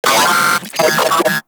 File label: Vocal Pain; Moa; Vocal Set D